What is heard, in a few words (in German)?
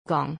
Gong